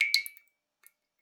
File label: Drips